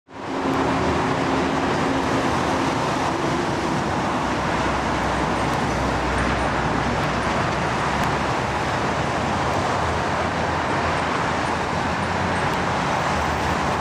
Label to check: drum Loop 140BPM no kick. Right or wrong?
Wrong